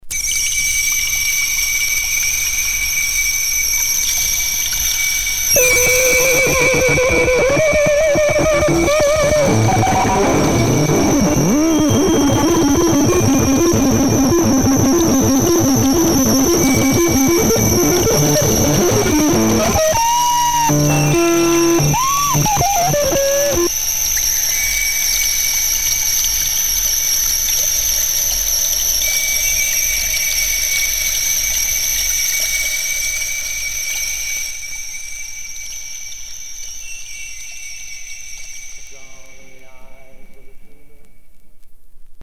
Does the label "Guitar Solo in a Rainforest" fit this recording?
Yes